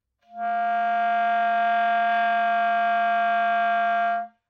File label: noise